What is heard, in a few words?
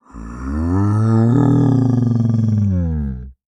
Animal Mammal Carnivore Cat Spotted Leopard Growl; animals; AdobeCC